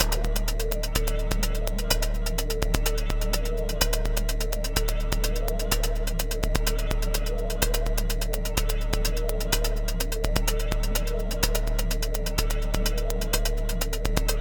Minimalism, Processed Percussion

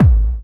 BD; Best Service; Dance Mega Drums

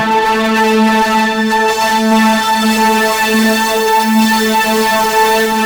MORPHUZZ; Spectrasonic Distorted Reality 1; MORPHUZZ BS

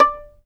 fiddle pizz D5; Sampleheads; Whole Lotta Country 1 & 2

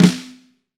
FLAM, Vintage Snare Drum; Q Up Arts; Johnny.C's Vintage Snare Drum; 6.5X14 GRT

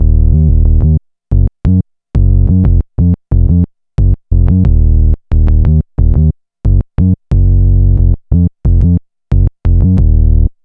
Bass Line; Eclectic Electronica

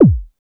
Moog BD; Moog Modular55

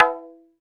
DM5 Perc, Alesis DM5 drum machine

